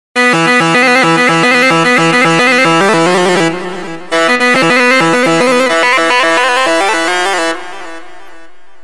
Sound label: rababa; melody